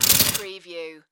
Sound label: Electrical Arc Zap